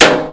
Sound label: metal box impact bullet; physics